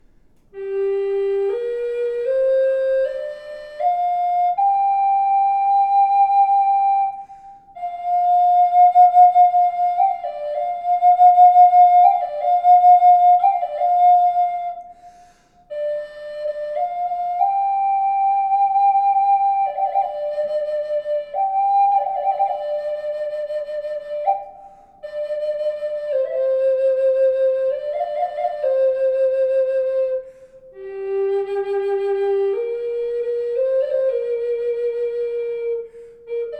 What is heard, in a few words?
reverb, Flute